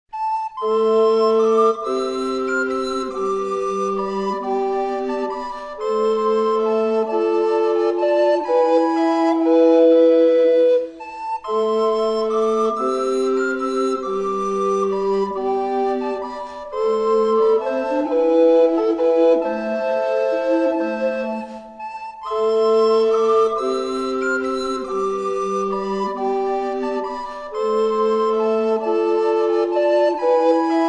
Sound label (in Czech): Flétnový soubor; Greensleeves - anglická lidová